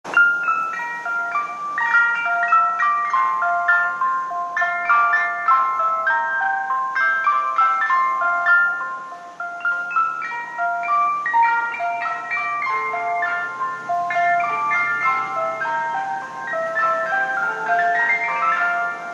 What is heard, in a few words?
Icecream truck